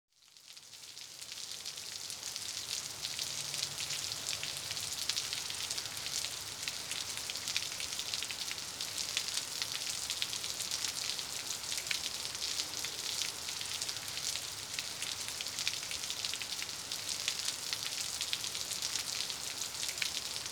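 rain On Cement, Splattering Rain On Cement During Storm, Medium To Light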